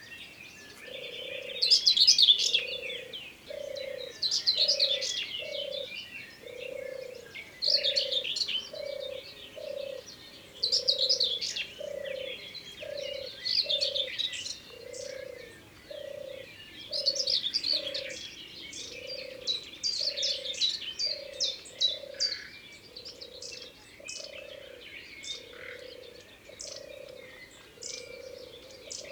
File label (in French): Tourterelle Des Bois; Ardeche; Concerts Naturels De l'Ardeche